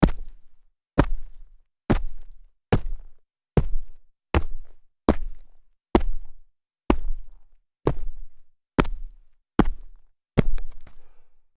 sneaker large jumps dirt

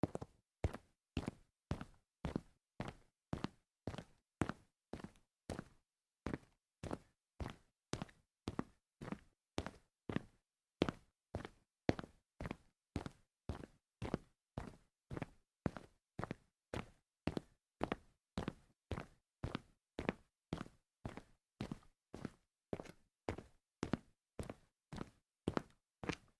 walking fast on tile

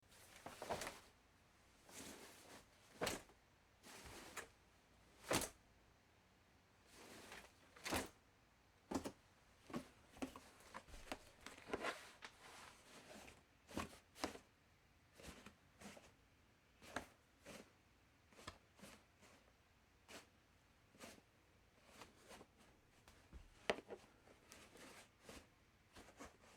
laundry basket actions

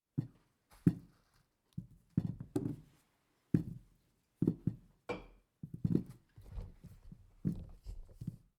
vegetables down on counter series

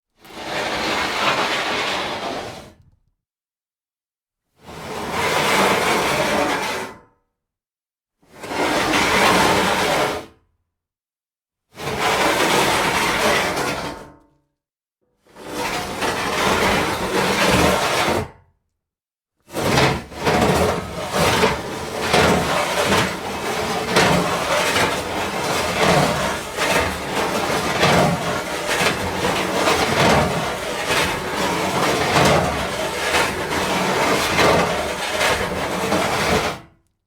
metal sheets sliding

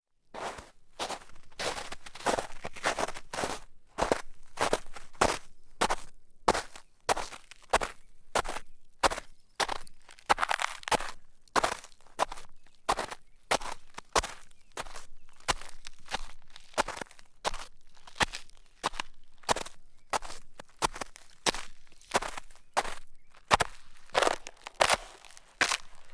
spring walking in snow, foley

